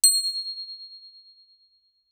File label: Crotale ff C8 stereo; Percussion; Crotales